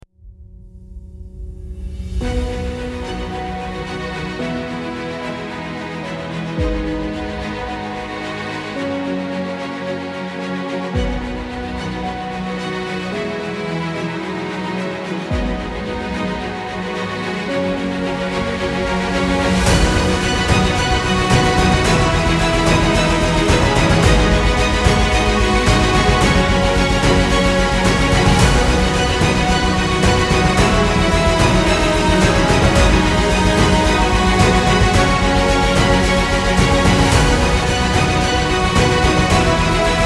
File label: triumphal victory